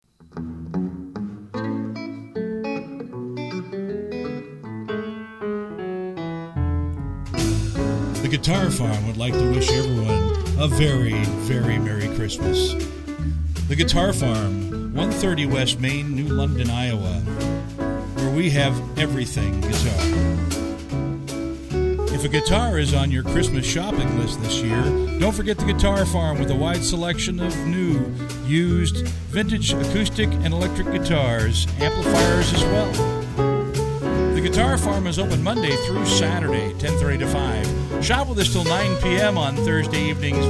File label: guitar farm